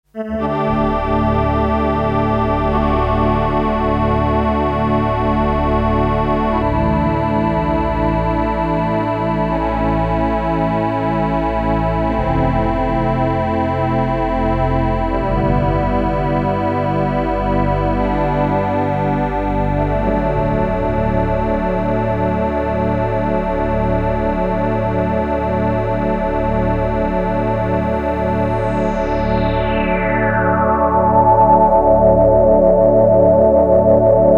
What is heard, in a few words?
RS370, Polyphonic harmonic generator; Use of the RS390 & RS110; Track 9 'O.K. Chorale'